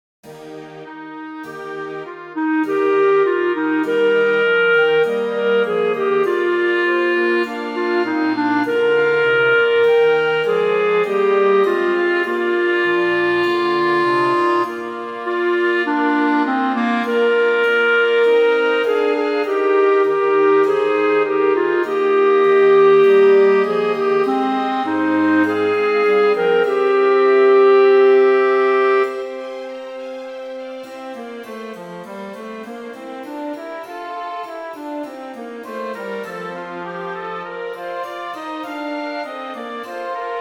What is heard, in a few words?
Alto; Their Sound Is Gone Out